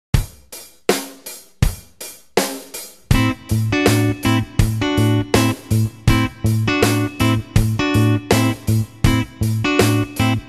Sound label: Rhythm Guitar